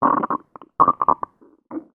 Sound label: human stomach gurgle